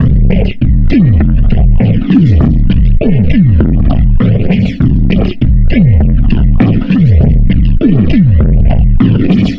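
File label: Sickly Loop, beats; Sonic Foundry (Sony Creative Software); Esoterik Beatz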